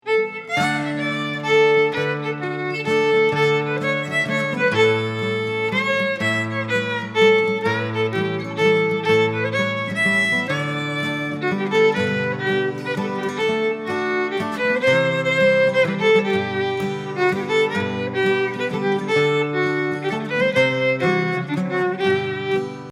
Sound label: Polka Mazurka; Albert Hall